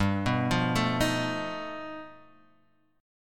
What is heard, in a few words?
G Augmented 9th, Guitar, Half Step Down